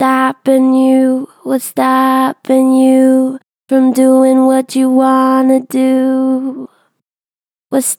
Gentle Vibrato "Stopin you", Vocals, Natural Vibrato Gentle; Harmor Vocals